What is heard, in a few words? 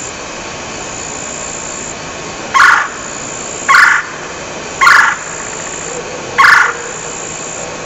Male Red bellied Woodpecker Call; wildlife; birds; calls; woodpeckers